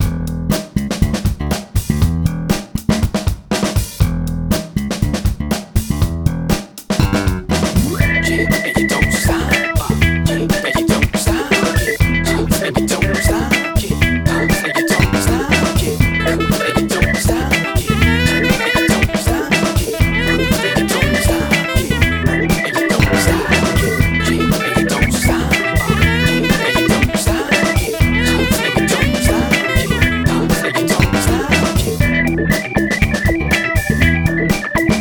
Funk Rap; Funky

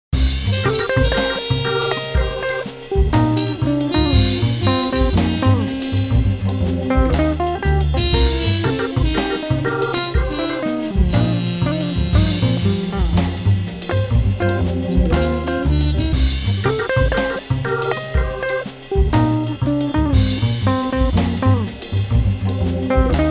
Jazz; Jazzy

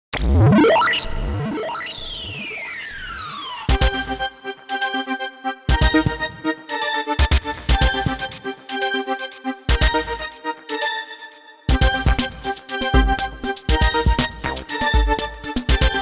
Electronic, EDM; 리듬

